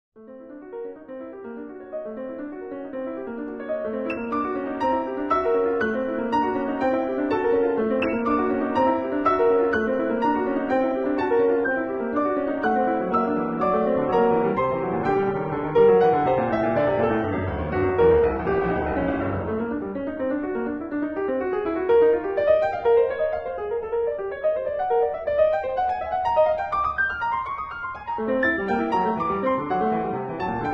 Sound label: piano sonata 2 3rd mvt